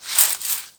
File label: bush, dry bushes